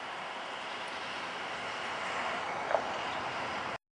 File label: hawk flying